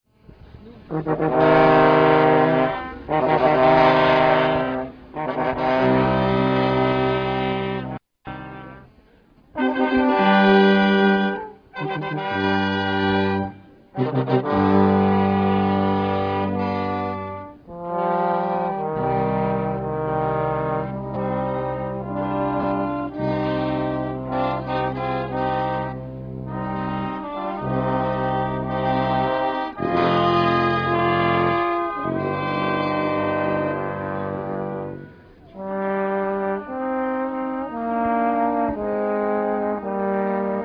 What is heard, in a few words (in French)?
marche